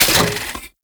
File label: Roller Deploy; Warframe; Kela De Thaym